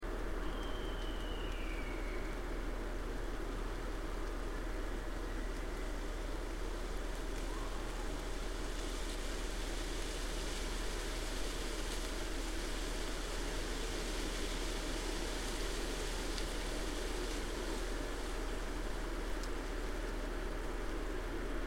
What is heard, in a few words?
mystery call breeze